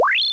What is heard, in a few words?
Powerup, Retro Game Weapons Sound Effects